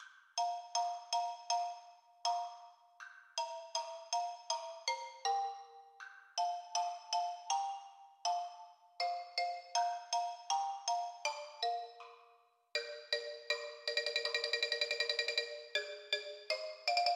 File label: tryout mallets